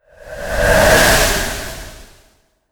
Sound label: Frozen Rose; Enchant